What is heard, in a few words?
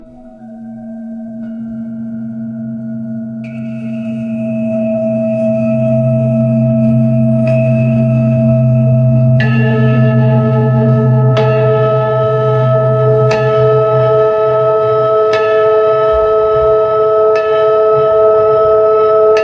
ambient meditation